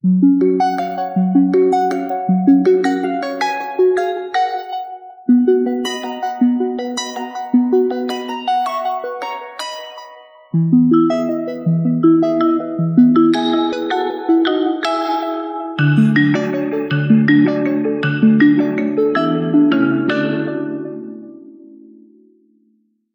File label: ringtones; Realme 8